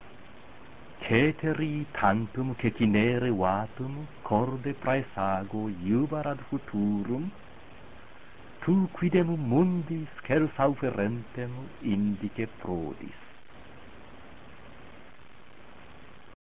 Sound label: Utqueant, latin